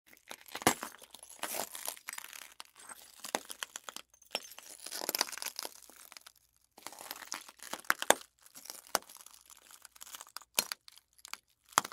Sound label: Walking on glass